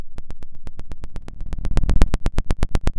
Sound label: Sound of a fire crackling